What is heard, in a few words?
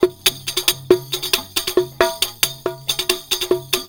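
DEF, Oriental; Best Service; Ethnic Journey Sound Cube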